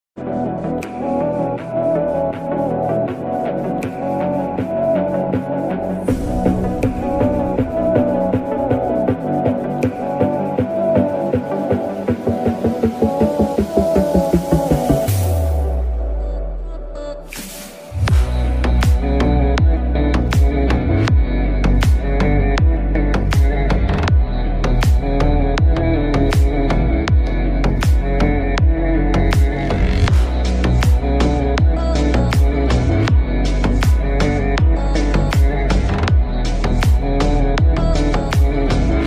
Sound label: ringtone song remix ringtone new english bgm ringtone